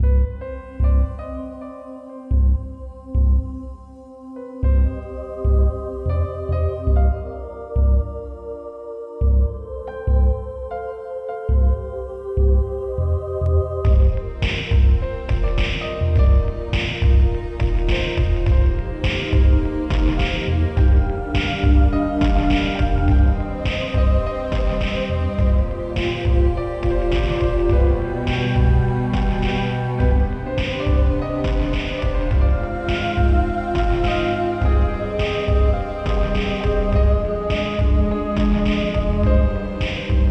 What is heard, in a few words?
Ambient